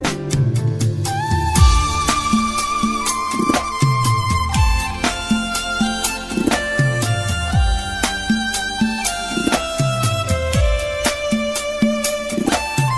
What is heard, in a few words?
telugu christian